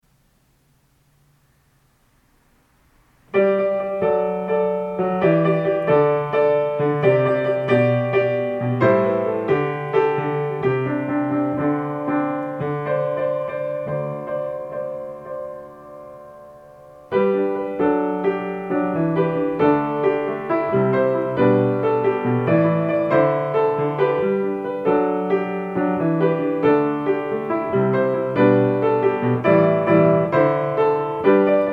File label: Jingle Bells Intermediate